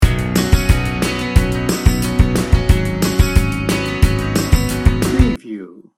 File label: americana loop